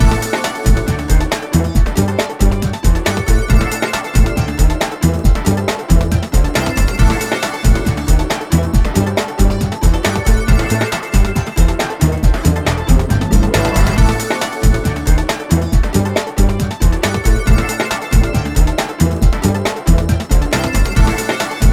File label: Music Loop; puyo puyo chronicle; nintendo 3ds